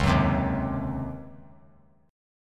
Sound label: C sharp Major 7th Suspended 2nd Suspended 4th, C sharp, chord; strummed